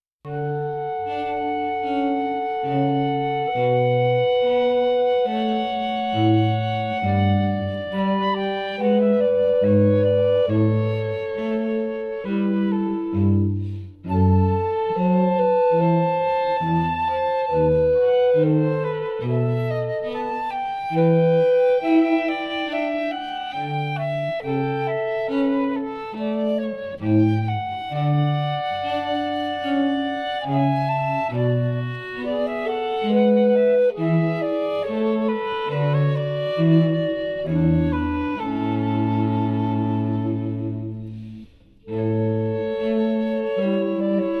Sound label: air on the g string